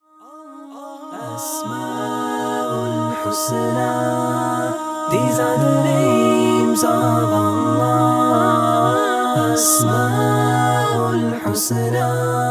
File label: Karaoke Version